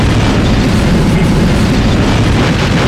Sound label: Big Explosion